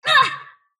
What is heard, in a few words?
sylph pain; dota; dark willow